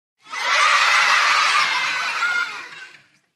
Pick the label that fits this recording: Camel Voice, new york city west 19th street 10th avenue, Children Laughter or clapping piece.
Children Laughter